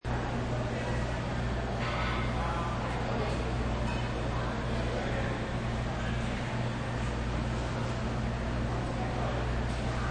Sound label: Foodcourt